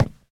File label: hardwood step; Golden Crayon Sounds